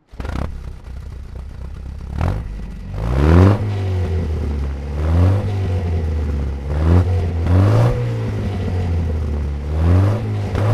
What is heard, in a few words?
austin healey 100 4 throttle